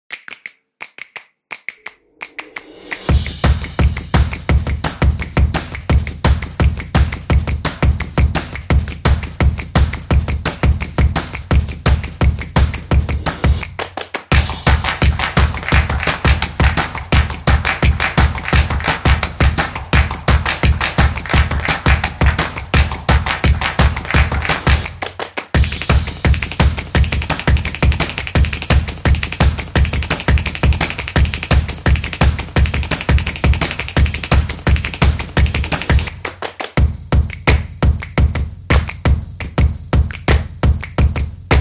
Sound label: Clap Advertising; casual game